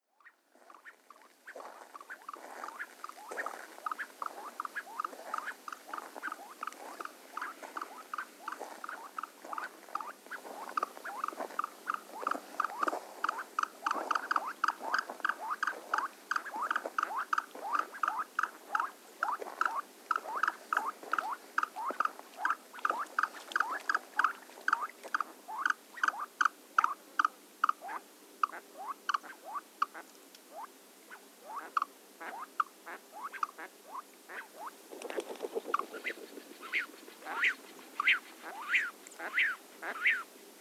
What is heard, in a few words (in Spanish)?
piuquen; lampa; municipalidad de lampa